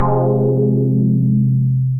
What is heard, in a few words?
Tech Chord C, Wavetable, Synth Keys; Ableton; Synth Essentials